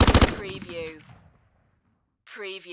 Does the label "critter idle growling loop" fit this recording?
No